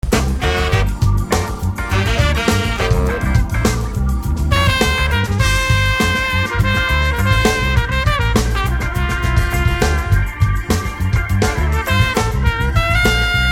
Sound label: funk solo